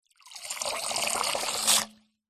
hospital syringe squirt out plunger into bowl metal water